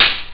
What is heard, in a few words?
hihat; ST-XX Amiga Soundtracker Sample Packs